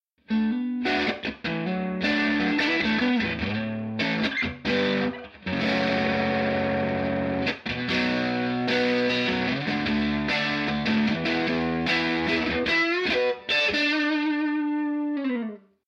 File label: overdriven